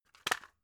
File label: drug package drop; arzt